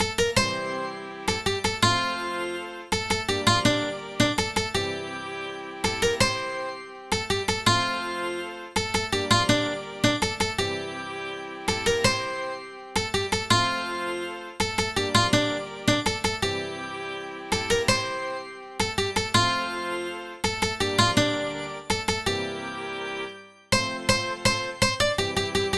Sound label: midi